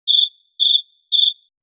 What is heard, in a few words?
Crickets chirping; insect